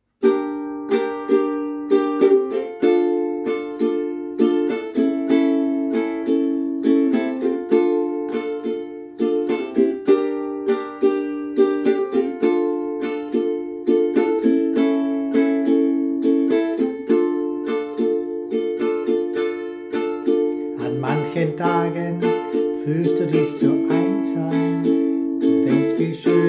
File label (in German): So lang man Ukulele spielt